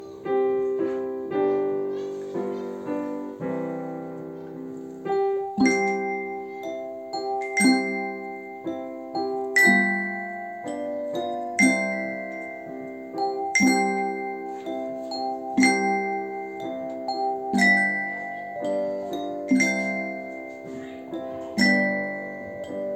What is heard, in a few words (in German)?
Ihr Kindelein kommet OGS Chor Schulchor Instrumentalkreis